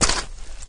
walk, flood

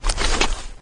run, flood

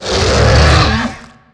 angry, dragon

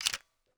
m3s90 load

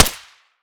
p226 suppressed fire

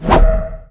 tail, dragon

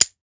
dry fire; serioussam2

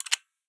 m24 insert, weapons

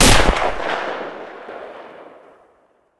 shoot

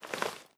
iron in; weapons; bf3

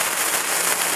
fuse

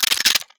sks insert

